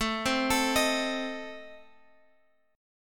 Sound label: A Diminished A, Guitar Open F; arpeggiated; chordshape; 21,19,21,22,x,x